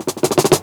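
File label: snare roll, Ragga & Dub Reggae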